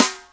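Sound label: piccolo snare, Boss DR660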